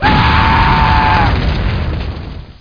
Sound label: flaming